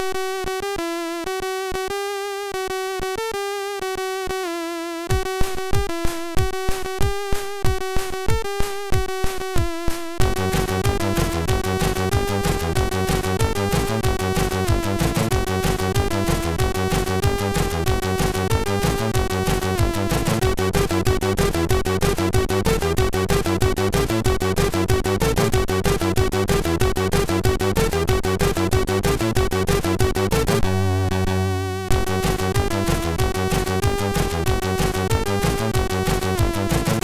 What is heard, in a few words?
Techno Mix sid MOS6581